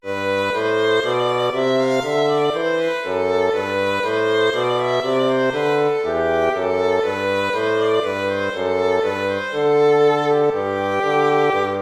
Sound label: baroque minuet major